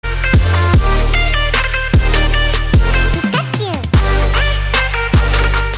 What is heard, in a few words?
Dubstep; Pikachu